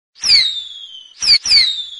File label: Rocket Message Notification